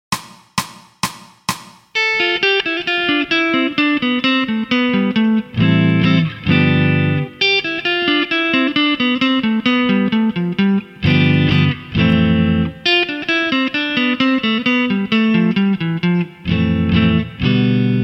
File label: Technical Study, electric guitar; electric guitar performance diploma